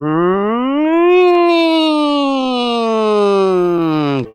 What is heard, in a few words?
human siren; ooh ahh